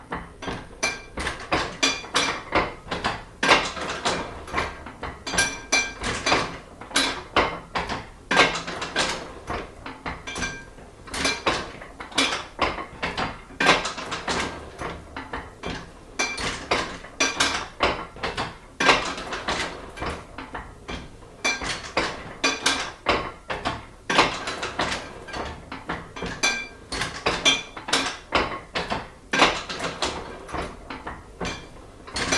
letterpress pedal Boston tiegel manual movement side perspective; mkh8040; ortf; Sonniss GDC